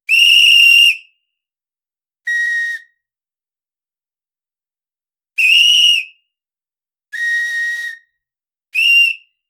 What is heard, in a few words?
lifeguard tweeting whistl; whistle